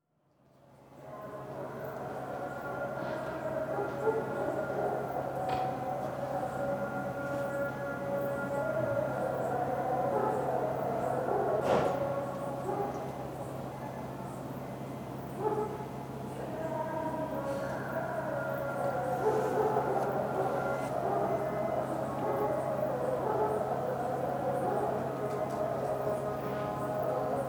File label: Skyline Atmosphere, With Distant P, Istanbul; The BBC Sound Effects Library